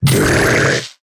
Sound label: Mimic Death